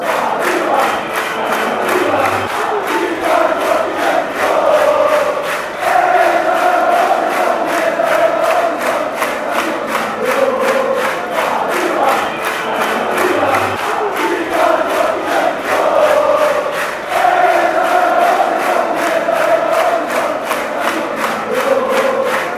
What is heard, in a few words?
now, chants